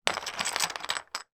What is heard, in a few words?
game connect 4 playing discs pick out of box